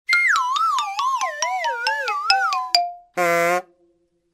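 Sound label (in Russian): падение скачками и падение с неприличным звуком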